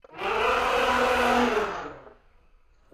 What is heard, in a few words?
elephant attack; fauna